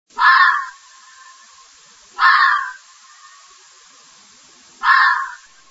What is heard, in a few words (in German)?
warnruf, fuchslaute; fuechse